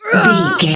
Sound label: voice female hurt pain